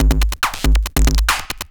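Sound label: DRUMLOOP ELECTRO 140; Techno2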